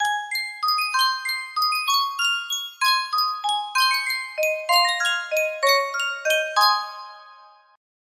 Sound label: sankyo miniature music box